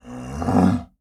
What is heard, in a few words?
Animal Mammal Carnivore Tiger Cub Roar; animals; AdobeCC